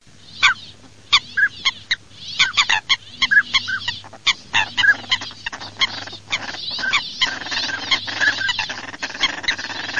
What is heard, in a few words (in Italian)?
FOLAGA CODONE